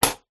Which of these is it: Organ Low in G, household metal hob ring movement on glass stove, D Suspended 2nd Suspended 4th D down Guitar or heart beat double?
household metal hob ring movement on glass stove